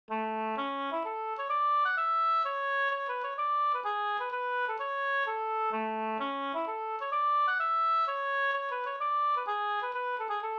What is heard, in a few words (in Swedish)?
polska